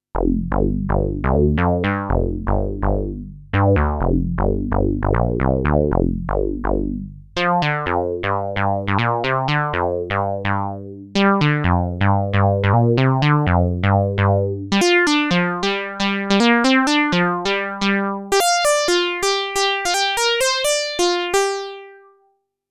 Saw Resonance

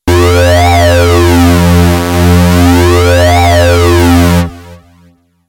Sync SH-201

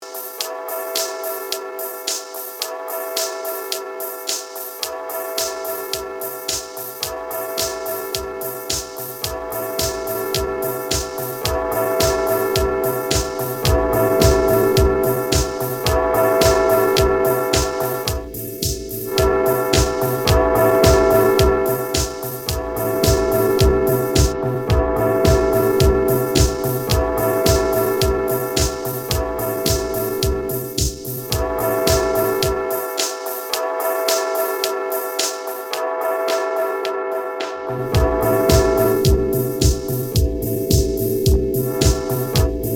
Disco Bells

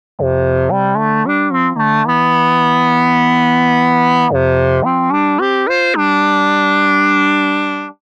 Filter Type 4075; Gforce Oddity 3; Across The Desert

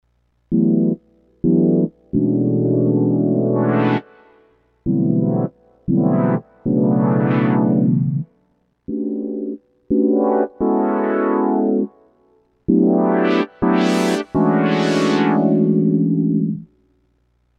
Numa Compact 2x Synth

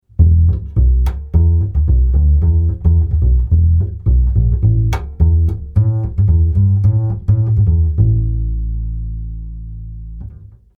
Upright Bass COMP3A Jr

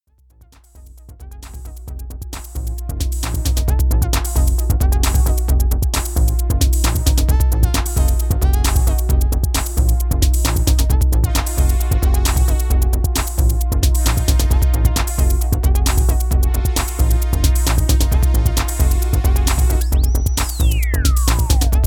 Tr808 Kit Pads

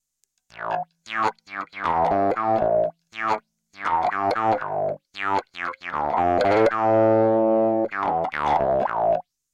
Waldorf 2 Pole Bass Env Follower HP Gain

